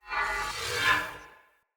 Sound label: Great Sword Whoosh Accent Spin; Weapons; Player; Mendicant Great Sword